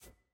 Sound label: room select room hover